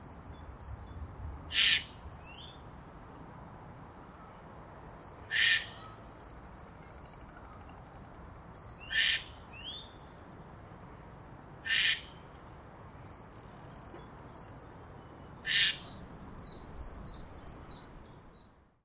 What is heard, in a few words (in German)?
eichelhaeher, Vogelstimmen; Hahnenkamm erlebnispfad